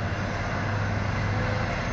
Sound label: Motor, Train; OpenBVE; Expreso CIWL Fleche DOr